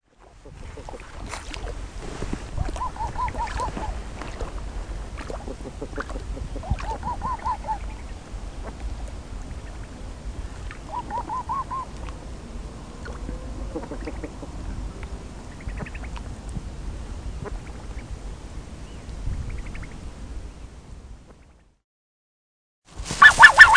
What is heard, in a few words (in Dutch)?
magelhaengans